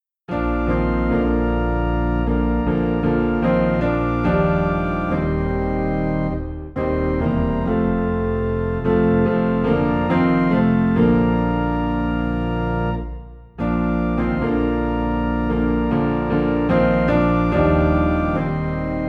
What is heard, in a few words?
Piano and Organ Low in B Flat